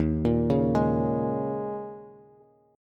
D sharp 7th Suspended 4th, Bass; D#7sus4 arpeggiated; JGuitar

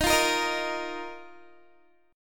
Eb Suspended 2nd, Guitar; Ebsus2; strummed

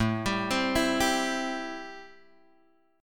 A-Minor 7th A Guitar Open G

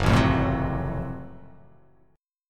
F sharp Minor Major 7th Flat 5th; chordshape; down

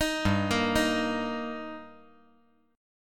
G Minor Sharp 5th G, chord

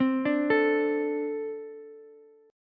A Minor Double Flat 5th, Ukulele; Ambb5 arpeggiated; x,12,10,12; JGuitar

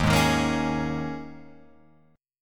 D sharp 7th Suspended 4th, Guitar, Open F; D#7sus4 strummed; JGuitar

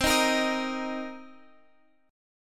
C Minor 7th, Guitar; strummed; Cm7; 20,18,20,20,x,x; 4E,4A,5D,5G,5C,6F

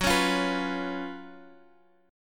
Gb Major 7th Suspended 4th

